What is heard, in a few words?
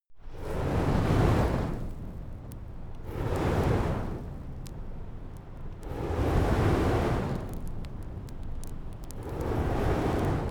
flamethrower short whoosh multi crackle